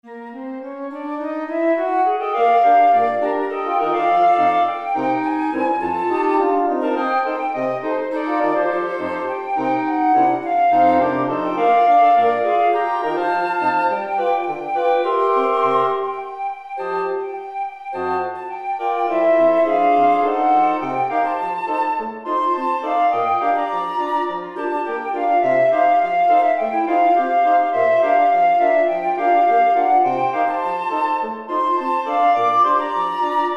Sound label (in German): blaeserquintett